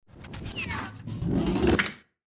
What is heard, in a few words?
plasma rifle reload